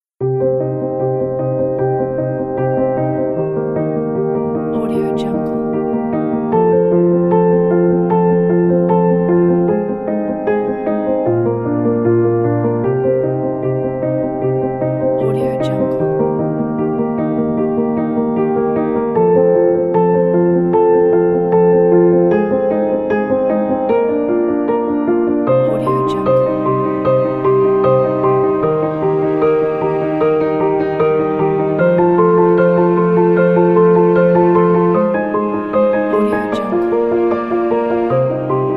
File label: inspirational beauty piano